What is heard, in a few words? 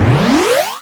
Arcade Alarm, Video Game Sounds; 1001 Sound Effects